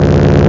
monster pickup; aerial racers; aerial cars

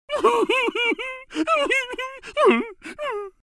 miss; scoobykickinit

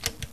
Switch On

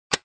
Roll Over; scoobysnackmachine

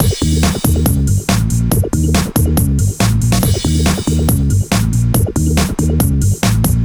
groovebox

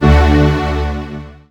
browse, Ambient

